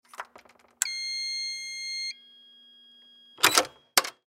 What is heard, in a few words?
Hotel Room Key, Foley Hotel Room Front Door Insert Key Beep Unlock; Miscellaneous Door; Blastwave